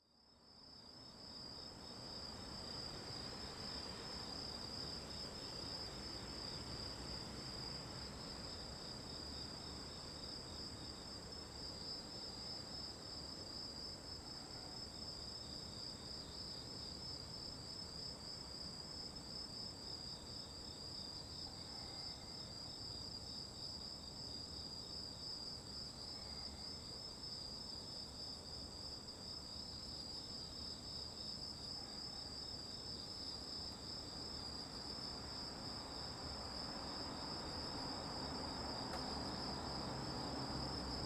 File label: Hachioji at Night